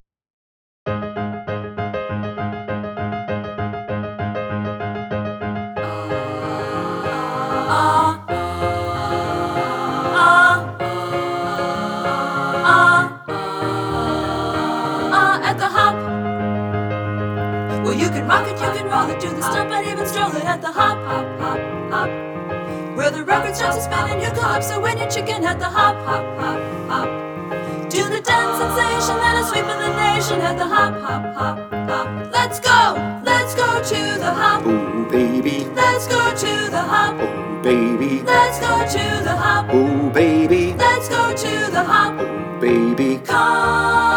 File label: satb